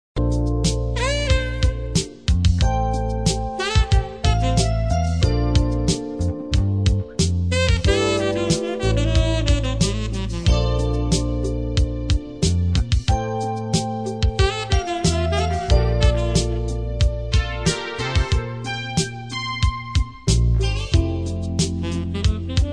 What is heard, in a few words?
steel pan sax